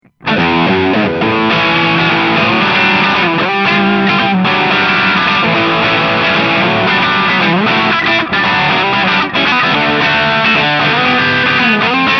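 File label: Crunch, guitar; GTRS P800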